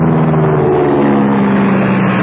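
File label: sound of a propeller airplane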